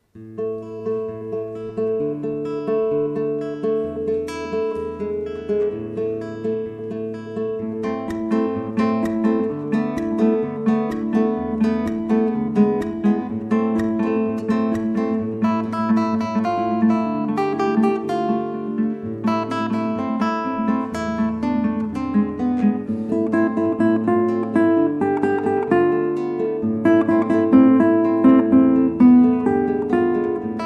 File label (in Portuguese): toada live